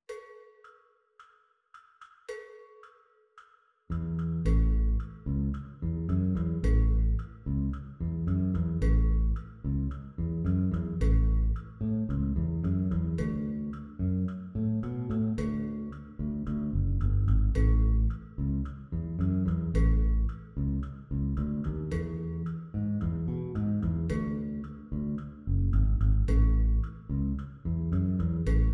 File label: Drums Cuban Swing backing track; Grade 5; classical drums handbook